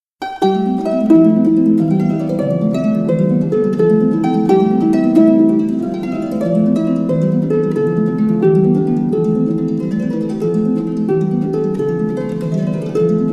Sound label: toccata